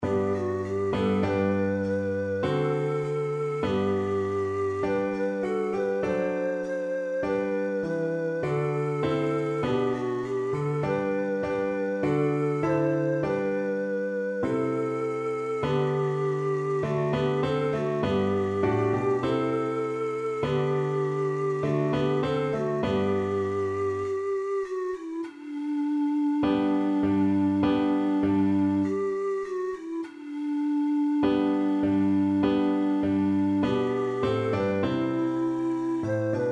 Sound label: nkosi sikelel, ocarina four hole